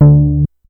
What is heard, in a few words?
Synth oneshot High D, Synth Bass; Synth Bass One Shots; Slate Digital BASSHOLE Sample Pack